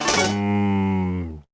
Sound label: slots; burns casino; pickles